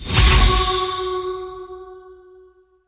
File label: resurrection